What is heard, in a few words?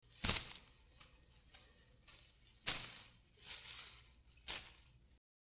Leaves Hitting Wall